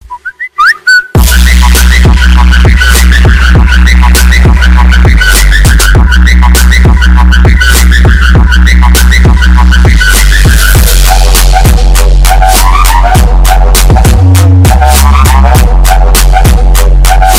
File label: whatsapp remix